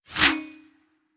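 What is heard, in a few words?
Trident swoosh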